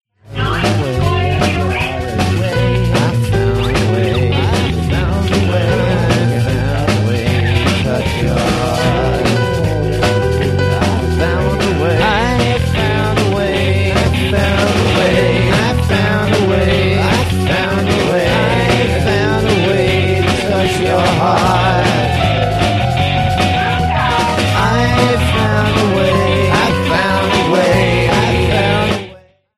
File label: bells strings